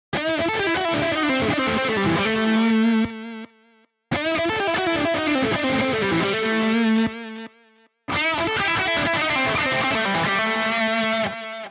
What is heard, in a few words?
Lead split coil Humbucker HB3, pickup; panico pickup hb3 humbucker